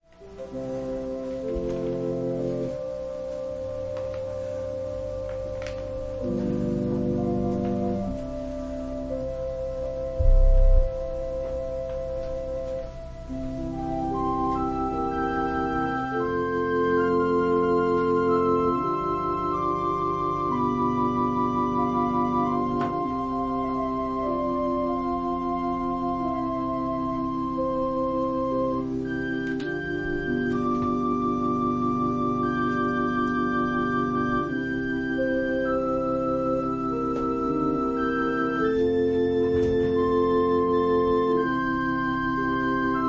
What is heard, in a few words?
Organ prelude